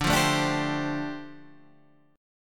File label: D Minor 11th D down Guitar Standard; Dm11 strummed; JGuitar